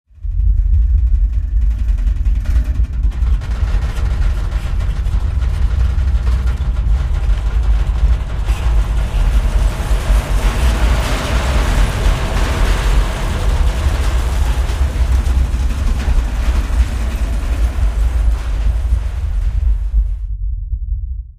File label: bomber shake effect; netent